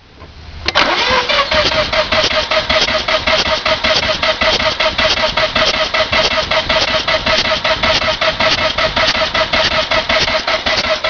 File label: blown, rx-7